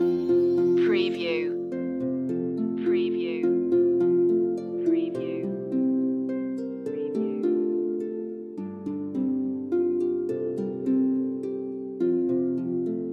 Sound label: orchestral loop; the holly and the ivy; media music now